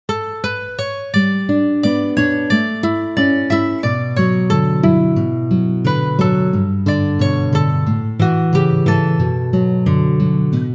guitar

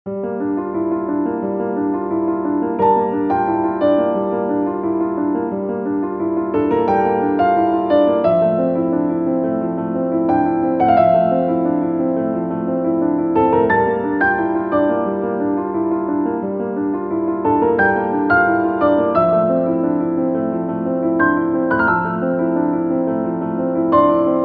piano; 怪しい 怖い 不思議

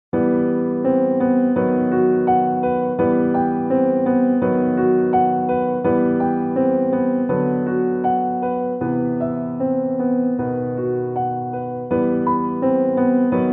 piano; schumann; 子供の情景 第１２曲「眠っている子供」; シューマン

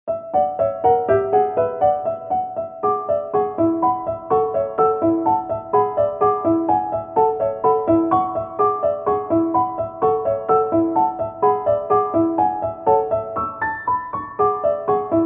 musicbox; lyadov

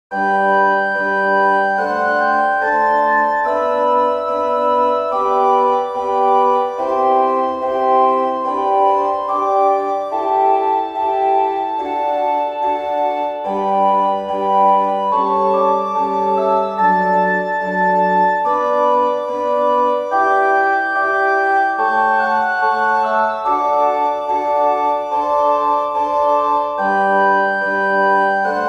organ; elgar

